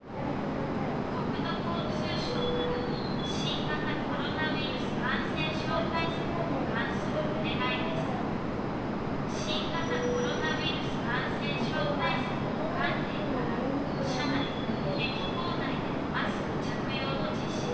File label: jr fukushima platform